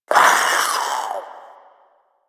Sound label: Proto Infested Maggot Awake, Vocals; Warframe